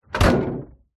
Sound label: household drinks fridge close